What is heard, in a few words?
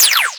Fire 2D Shot, Weapons; Warframe; Boar; Boar Prime